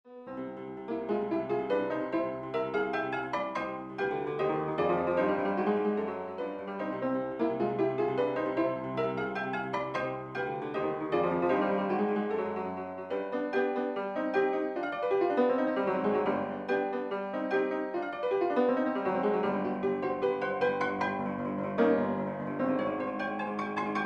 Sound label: Realistic Piano Fortepiano Patetique